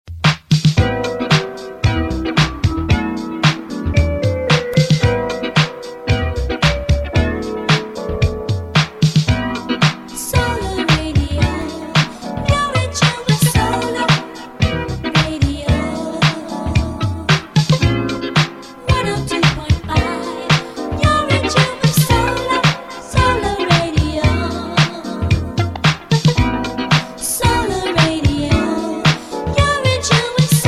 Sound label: Radio 102.5 London Jingle; Solar Radio